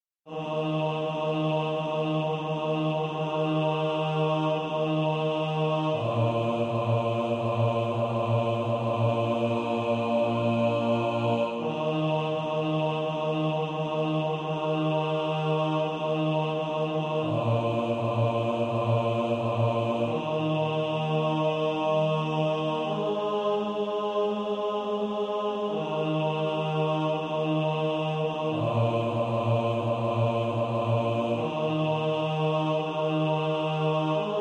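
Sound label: bass, practice music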